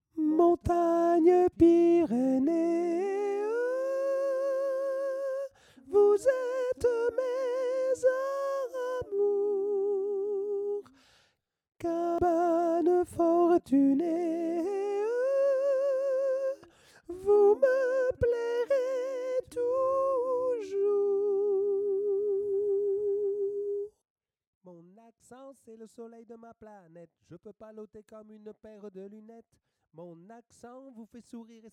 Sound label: soprano; Sopranes